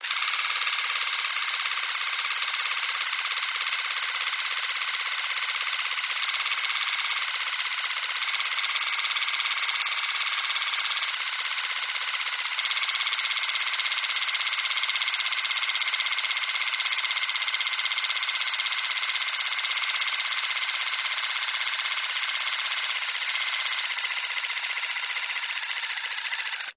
Motor, Small; Electric Toothbrush, Fast Constant Slows To Stop